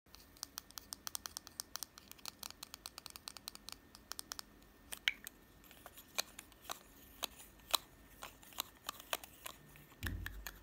Opening and closing lipstick

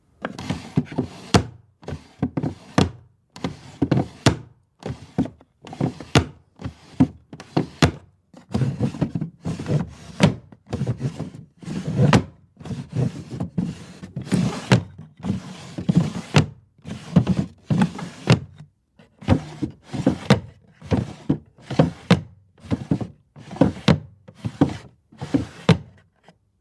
door of cabinet; باز و بسته کردن

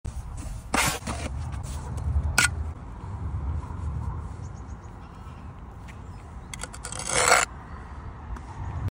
Masonry trowel; کاردک فلزی

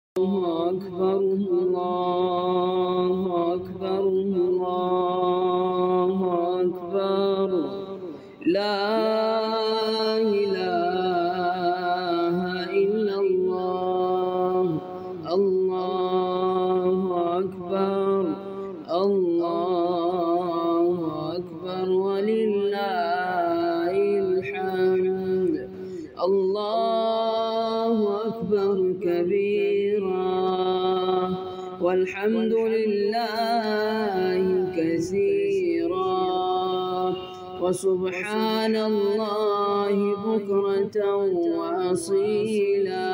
takbir, تکبیر عید فطر

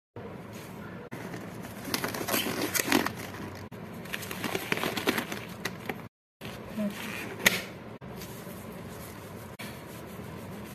wearing gloves; پوشیدن دستکش

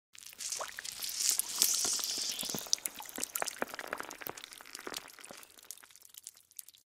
Lemon squeeze; چلاندن لیمو

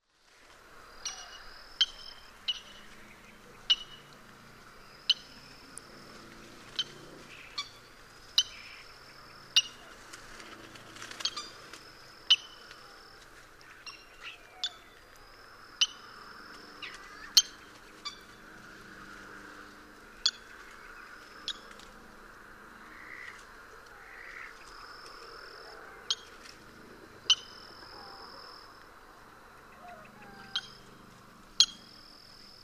Black tailed nativehen

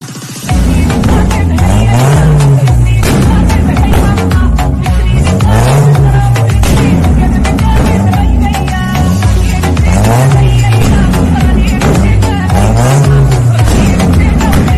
exhaust

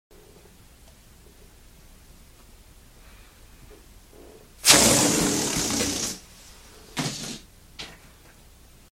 Deflate

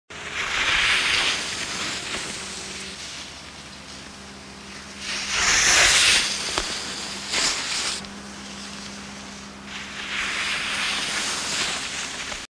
Snow skiing; اسکی رو برف

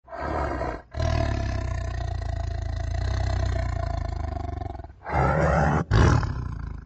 Liger

